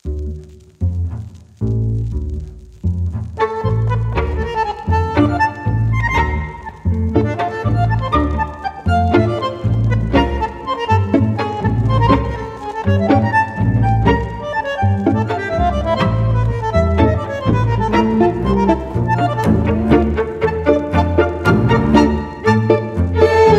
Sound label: tango Music aged